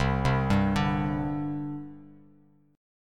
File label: C Suspended 2nd, C, down, Bass Standard; Csus2 arpeggiated